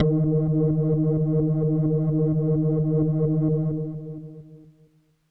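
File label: Leslie Kawaii D#5, organ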